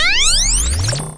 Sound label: space whirl up; Electro and Synthetic